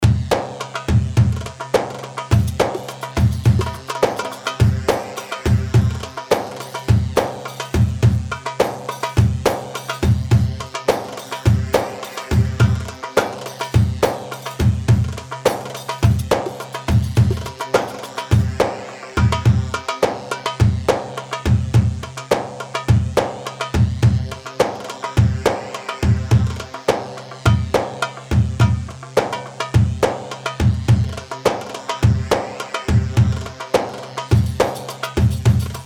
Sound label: Saiidi 105, Darbakati, Sharqi; صعيدي